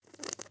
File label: glue slurp